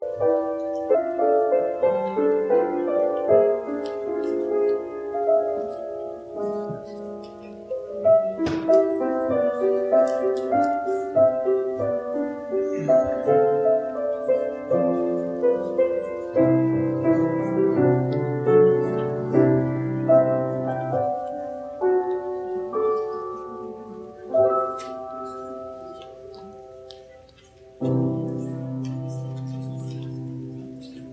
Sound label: preservice, instrumentalists